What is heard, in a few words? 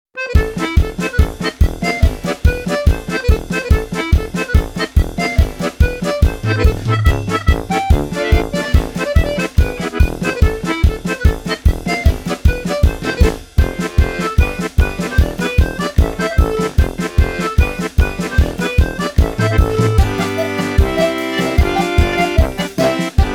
accordion comics; Comic Fast